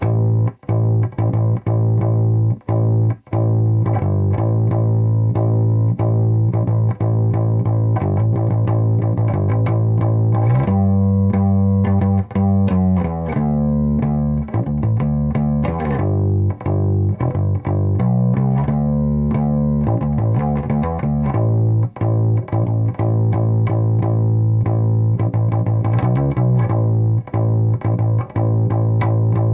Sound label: solo